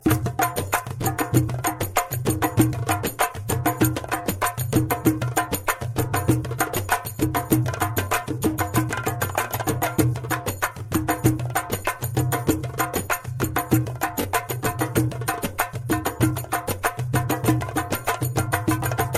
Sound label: darbouka ringtone